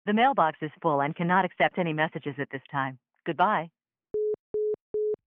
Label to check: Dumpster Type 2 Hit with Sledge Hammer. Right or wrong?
Wrong